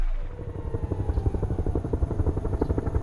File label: helecopter